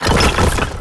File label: wall hit; cstrike; pubg